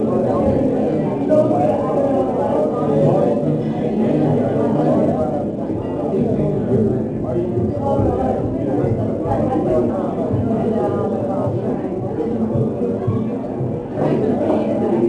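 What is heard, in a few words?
Crowd ambience; Levels Hub Diner 01 Dress Tiana; Cake Deco Crowd Amb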